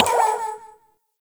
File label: Messenger Mail Open; Warframe; Ambience; Nineteen Ninety Nine